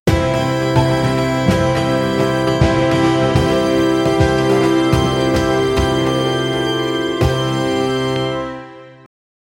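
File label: evening news opening music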